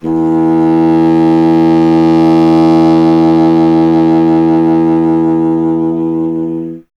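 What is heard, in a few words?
wind contrabassoon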